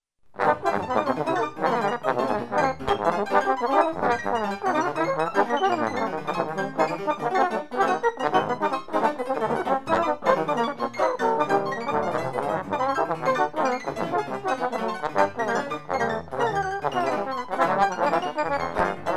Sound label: study 15 trombones; nancarrow